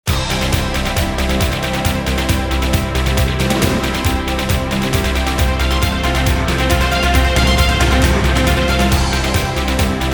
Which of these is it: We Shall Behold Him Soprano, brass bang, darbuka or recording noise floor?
brass bang